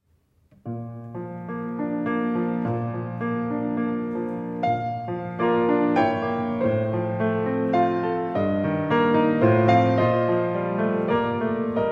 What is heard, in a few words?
Ballotté, ballet; adv 1 ballet